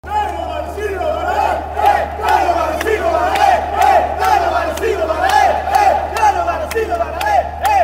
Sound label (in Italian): Dai Novara, cori curva nord